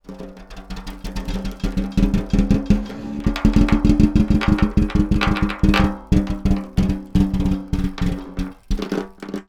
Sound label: Snare Drum; Percussion Samples